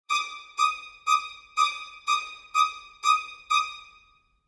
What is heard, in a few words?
Psycho String Stabs; soundscrate